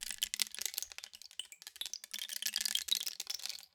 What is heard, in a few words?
Rain Stick, Percussion Samples